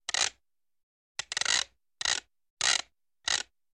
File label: Robot Body Movements, cartoon fx